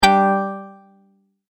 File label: Multimedia Tone-Stop; Sound Design; McKinney Sound